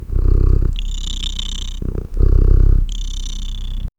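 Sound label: gentle cat purr fairy like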